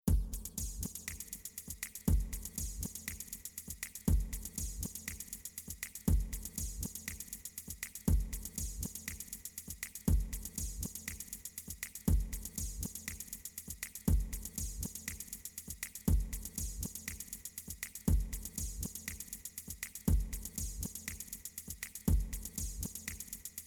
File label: mysterious thief, only bass